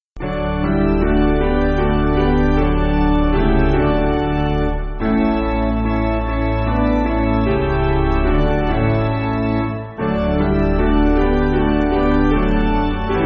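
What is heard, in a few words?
Piano, Backing Files for Churches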